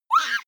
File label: child shriek